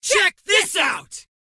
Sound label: Mob Voice Attack; Bad Brawler